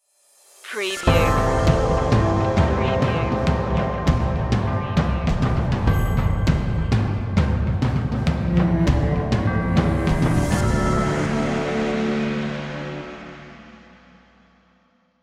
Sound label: ambient army stinger